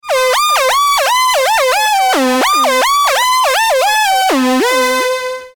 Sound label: Solus VRS 23